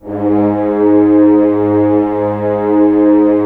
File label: STR Horns; Roland - LA Composer vol. 4; HZ Horns